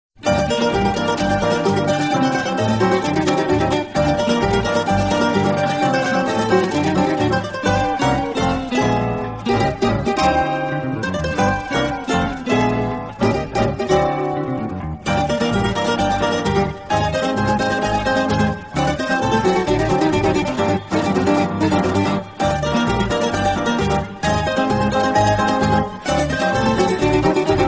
Guitarra Baiana

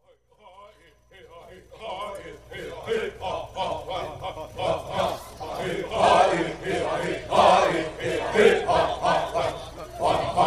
Canto da furacao de orelha

